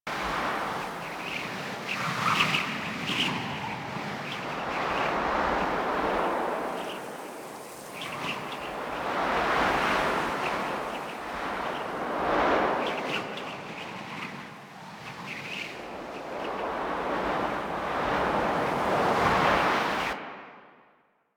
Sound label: wind grass large loop; ambience; props; Eidolon; Warframe; Eidolon Plains